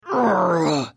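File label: Hit, Khajiit female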